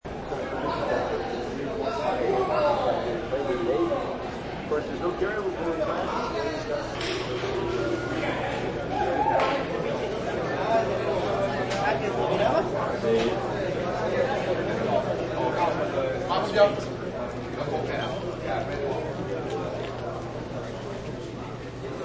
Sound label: Ticket Counter; Loop ambience recording in Santa Anita race track ticket counter area